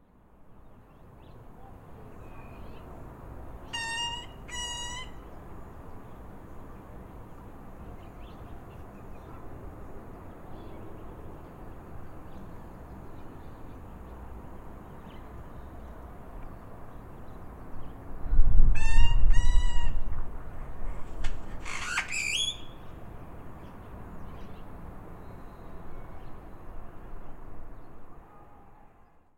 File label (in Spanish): Canto del tordo negro o zorzal